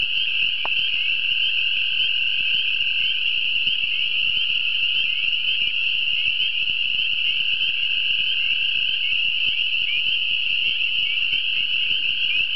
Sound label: peepers and toads